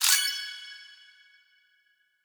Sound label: Activate; Treasure of Nadia